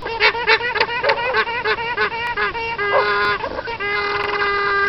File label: alarm call, alpaca